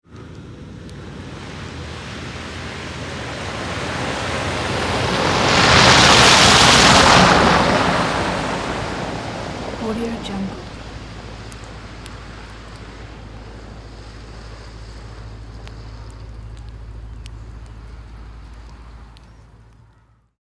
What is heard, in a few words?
4 x 4 car past on snow covered road